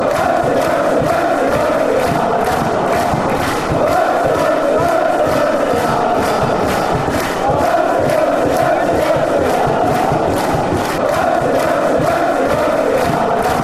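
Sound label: bouncy bouncy, football chants; ni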